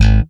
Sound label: Bass, pull G#1; Sampleheads; Will Lee Bass Library